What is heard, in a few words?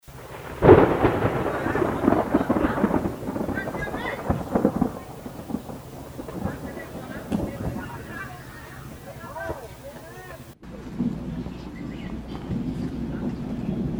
thunder